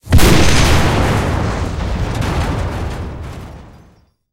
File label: tank destroyed